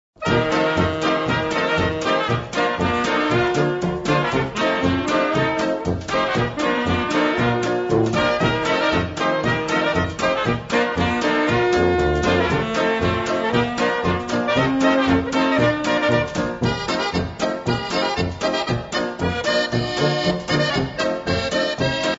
PILSEN POLKA

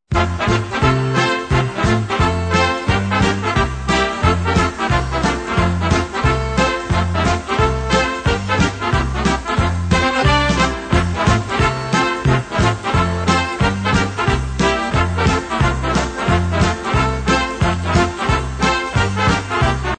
schottische

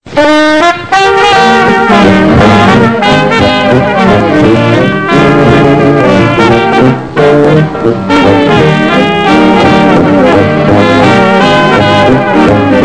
WALTZ

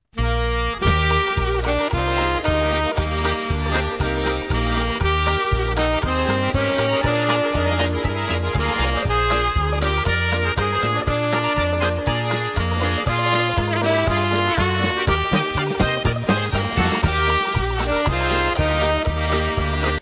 polka

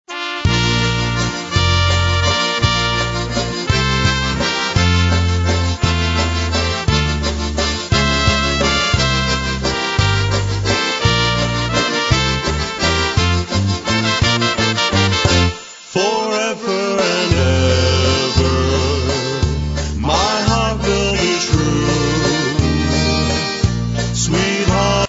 waltz

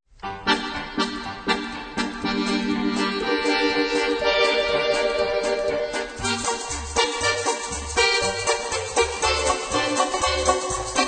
old country polka

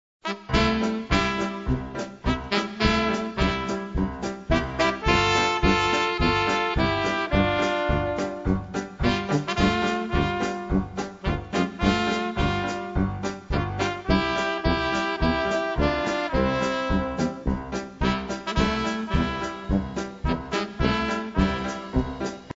POLKA

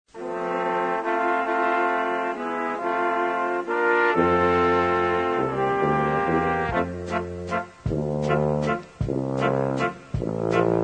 waltz

